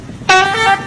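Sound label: Big Truck Horn Notification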